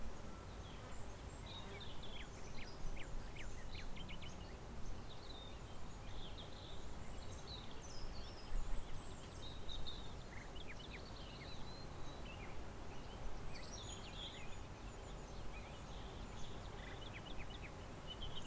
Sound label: houses day, ambient soundscape background; Soundscape Overhaul